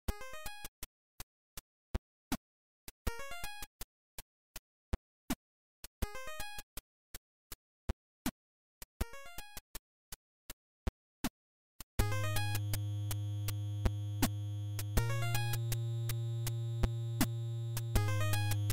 8 bit music

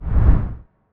epic swishes

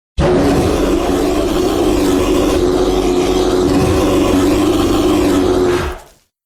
grizzly

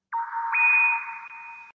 notification sonar

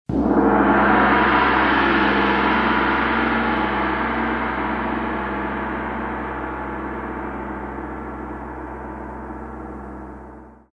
gong

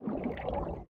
squid idle, minecraft

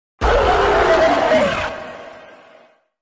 cartoon dog krik

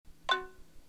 link, zvuki telefona blackberry bold 9700

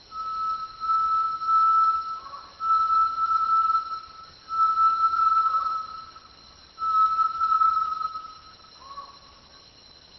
tinamou; major

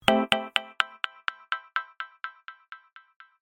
gated euro; Рингтоны смартфона Micromax, мелодии будильника и сигналы сообщений

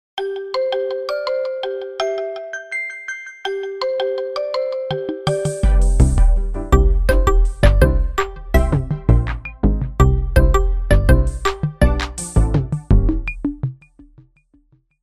electron, itel

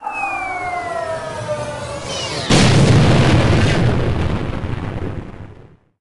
meteor

